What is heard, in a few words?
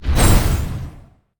Mech Tyranno explo; monster